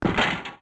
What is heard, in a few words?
Magic Armor Step